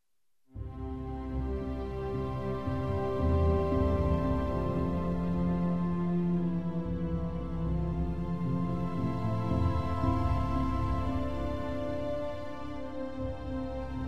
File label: melody groups violas cellos winds; violas cellos winds 8v